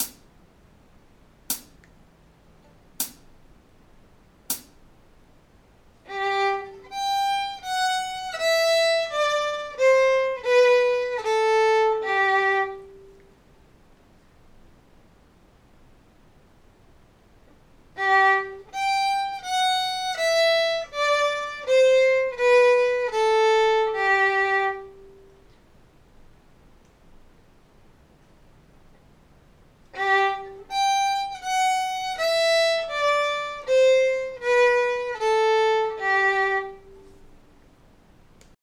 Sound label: g major scale decending, fiddle; Exercises